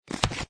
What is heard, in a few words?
card slide; Arcane Quest; android game